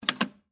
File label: Telephone Check, Technology, Telephone, Check, Hook, Switch, For, Dial, Tone, Pay, Phone; Telephone Sound Effects; BlastWave